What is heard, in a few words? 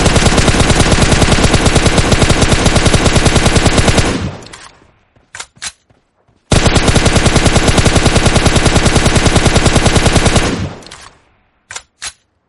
gun sound; free fire